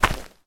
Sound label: footstep; skyscape